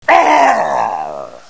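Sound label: battle cry; svencoop; sc rpg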